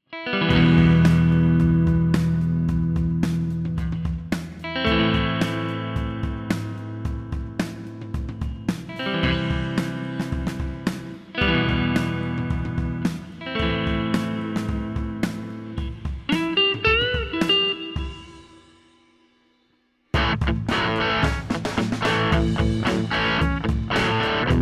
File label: mesa crunch